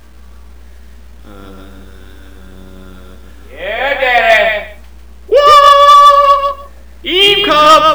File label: waaah